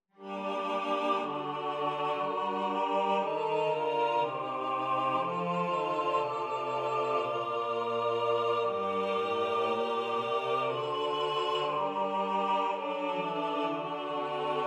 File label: SATB cello; korsakov problem 25.2 final version